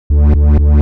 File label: 125 G Bass, Complex Electro Synths